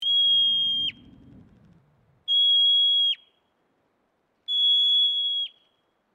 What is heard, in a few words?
mormot sound